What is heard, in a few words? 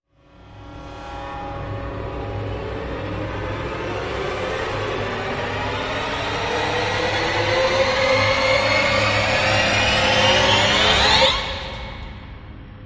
Rise